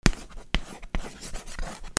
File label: FRICTION